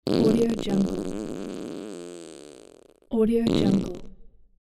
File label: fart